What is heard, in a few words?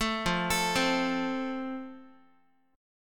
F Major, Guitar; arpeggiated